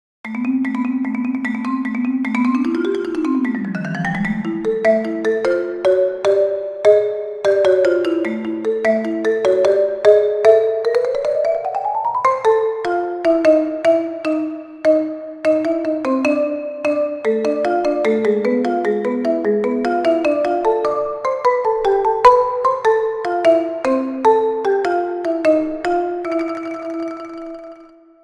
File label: Marimba Solo